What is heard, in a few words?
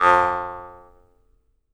jaw harp, Pitched Percussn, Jaw Harps